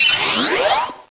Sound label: jet gun beep, weapons